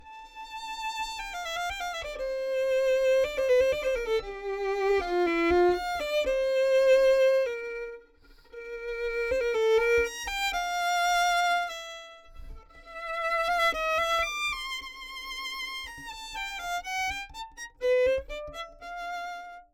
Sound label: Solo, violin; DPA